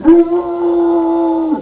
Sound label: start; Pacman